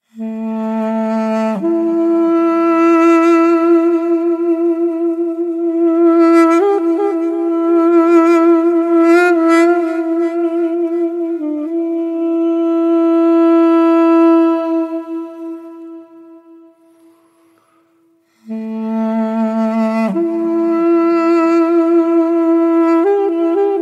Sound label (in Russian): zvuk duduka; grustnye ringtony